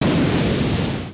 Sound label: blast